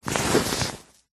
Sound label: foley clothing high vis jacket thick hand into pocket; zapsplat